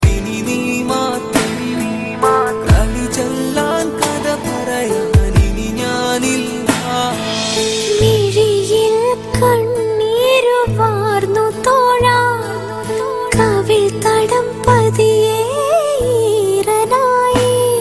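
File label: Malayalam Sad Song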